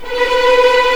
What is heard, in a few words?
B4 TREM, VLNS TREMOLO, String; East West; The Ultimate String Collection